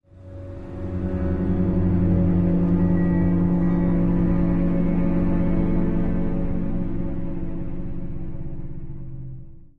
Eerie Stinger

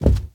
Jump Carpet Jump

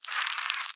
Egg Shake Louder; Devour; The Inn

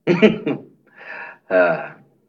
Soldier Laugh

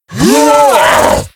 Knockout; The Inn Zara 3D; Devour 2021 gamerip pc macos

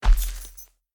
Footsteps Dirt Walk; Devour; The Town

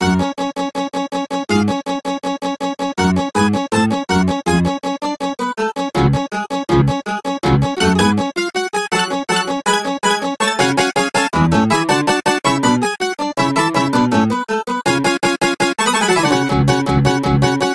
Mandolin; Minstrel Shield; The Bard's Tale Remastered Trilogy

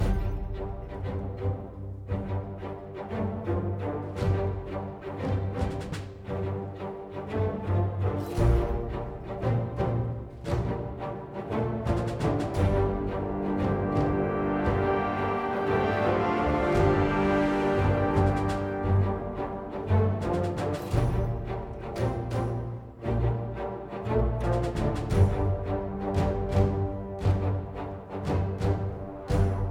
Default Loading Music

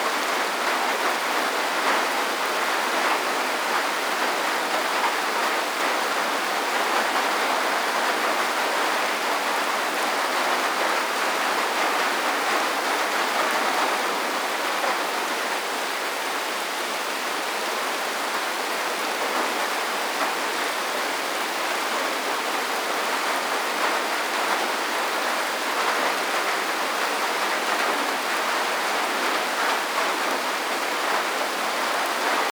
anbience rain medium outside forest under Roof

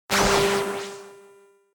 basebug death; Fight Knight

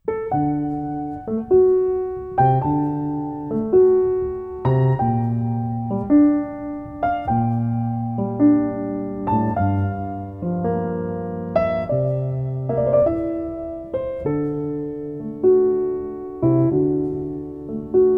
A Piano's Rhythm; Napple Tale: Arsia in Daydream

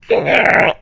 Pig Idle; Angry Birds Space

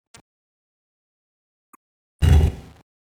pyramide key hole insert stone

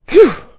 Pig Relieved

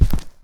Footsteps Rug; backrooms 2d android ios online windows gamerip

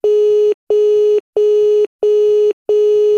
Phone Beep Bad

